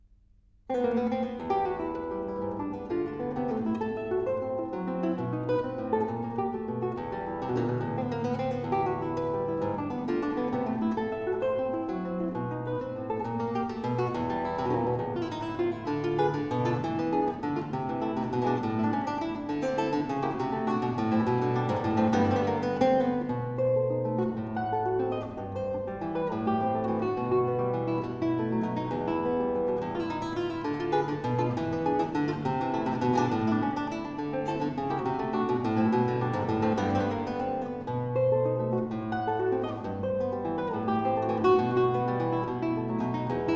Venezuelan Waltzes for guitar: No 3 in E minor/E major "Valse criollo"; Milestones; Music from the Novels of Louis de Bernières